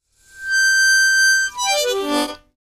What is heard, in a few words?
Mouth Organ Soft Fina, harmonica; woodwinds